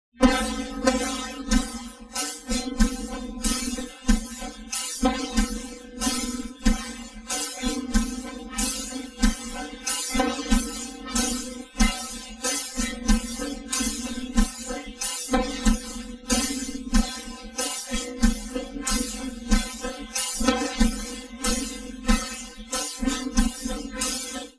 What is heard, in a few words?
Jazz